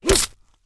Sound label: horseman attack; propack